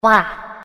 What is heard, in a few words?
Wah; svencoop; Holo; Inanis